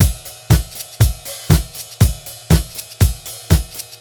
beat, Roots Disco; D#M; USB Soundscan vol.32; AKAI

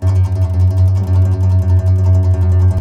C. Bass Balalaika, Plucked Strings

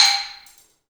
balambo, balafon; Heart of Africa

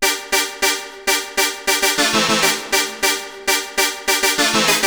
RAVING NOT, MIXED HOOKS; Masterbits - Tekkno Trax

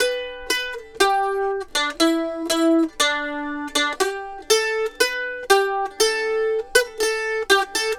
Mandolin Lead; Continental Drift Aftershocks

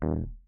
D#1, Rhodes Mark II 88 key hammered; CEPCo Sample Series Vol. 3

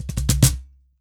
drm, WORLD DRUMS; World Instruments

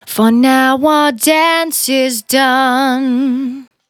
Finally 70bpm C, Velvet Vocal; Zero-G

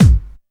dance 1, bassdrum; Ueberschall; Techno Trance Essentials; DRUMS; BD DANCE2

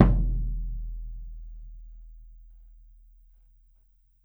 SURDO, Brazil Drum Perc; E-MU Producer Series Vol. 7 – Old World Instruments

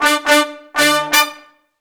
Disco Fever, BRASS-LICKS

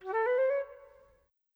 Flute; Sonic Foundry (Sony Creative Software); Orchestral VOL-2; Modern 13

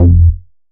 bass classic bonk F; Polyend; ARC Noise

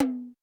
Roland U220 Dry Set

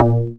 A#, Synclav FM PERCUSSION; Big Fish Audio

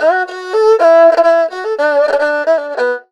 ERHU LICKS

